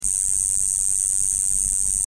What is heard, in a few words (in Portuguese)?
cigarra